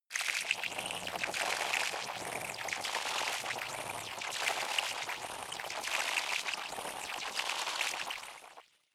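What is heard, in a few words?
water feature, science fiction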